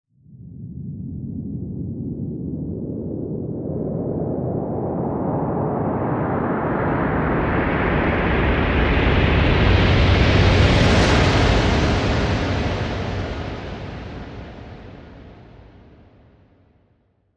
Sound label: Thunder Rise